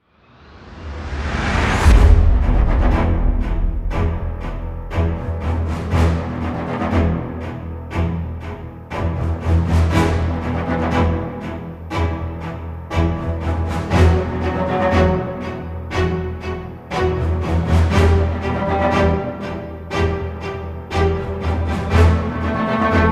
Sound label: heavy suspense